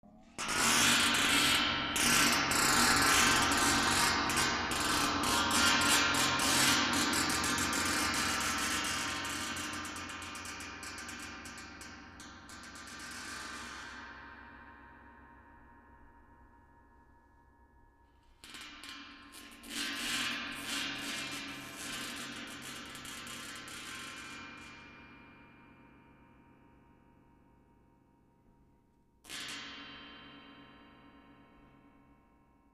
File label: Gong Scraped W Wood St